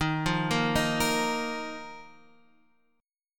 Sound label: D sharp Suspended 2nd D sharp, Guitar Half Step Down; chord